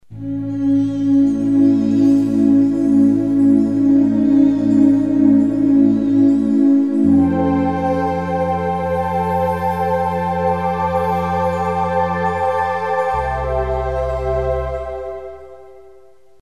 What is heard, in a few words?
Choir Pad